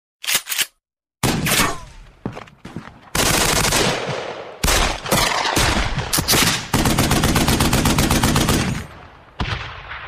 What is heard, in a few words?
Machine Gun Effect